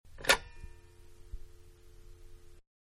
Pick Up, Telephone-Pick Up; Telephones (Sound Effects:Electronics); Manhattan Production Music

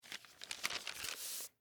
Bible Turn Page, Book; Foley; BlastWave

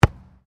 RUGBY BALL KICK; Various rugby sounds; Miscellaneous Sports; Sound Effects:Sports

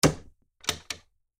Door Close Credenza, Door Close Credenza Latch; Household; Miscellaneous Doors; Blastwave

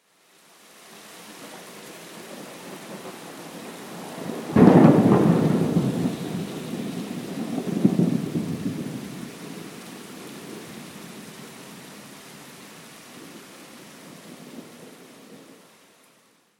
Rain And Thunder Crash

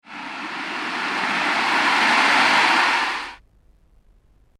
Heavy Artic Sweep, whoosh

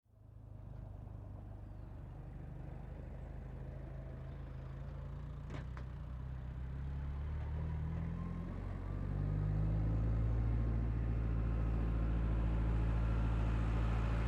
Tractor Farm By Distant Up Stop Off; 3 Point Turn; Tractors (Sound Effects:Vehicles); Big Room Sound

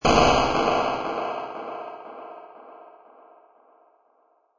Low Dly Crsh, Delayed crunchy hit; Low Delay Crush Hit 1; Sound Design

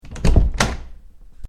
Fast Closing Wood Door With Metal Door Handle; CraftedSignals

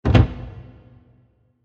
Short Wood Impact With Long Verb; Miscellaneous Impact; CraftedSignals

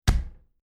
Low End Hit Punch Thump, A juicy hit thump/thud; Can be punch/kick, ball bounce, kick ball, or other impact of semi; Punch And Hit Sound Effects

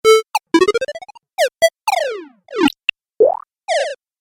Retro Game Beeps; Video Game Sound Effects

